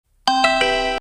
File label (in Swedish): tunnelbana